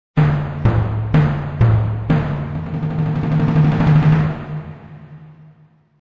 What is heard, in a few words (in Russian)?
litavri melodiya torzhestvennij perezvon